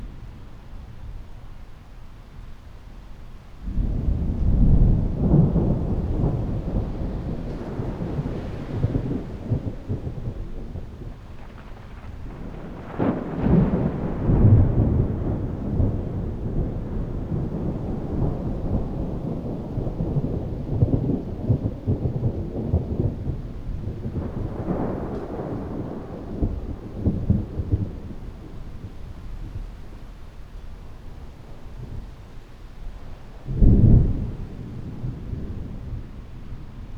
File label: Generic Thunder Loop; Ambience; Props; Warframe; Eidolon Plains